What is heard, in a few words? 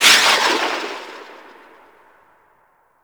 Tail, Weapons; Warframe; Tenno; Dex Furis